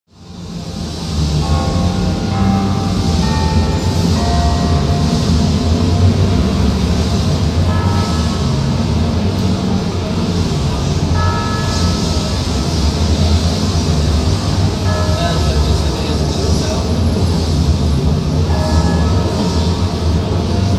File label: Clocktower, Market Street; Life on Market Street: An Audio Archive